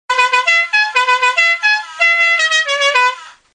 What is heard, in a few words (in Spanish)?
la cucaracha ringtones